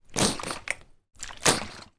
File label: household water bottle sports plastic squeeze air water spit lid end